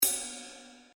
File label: ride, drums; SimpleDrums